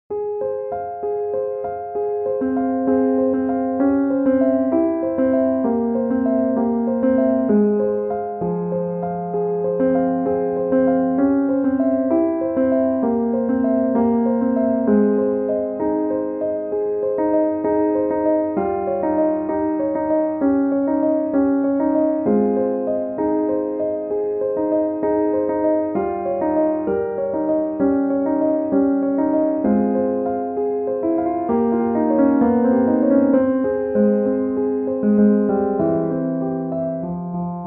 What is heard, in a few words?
Dolce Pianos and Strings